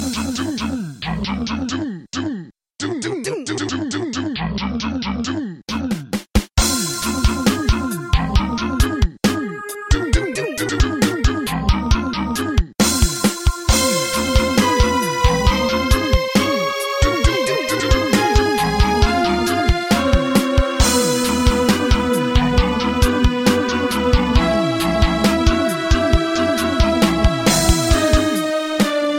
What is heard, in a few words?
rocket trance